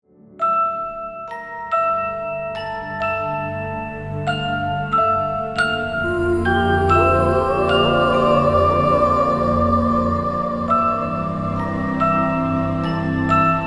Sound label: Theme Emotional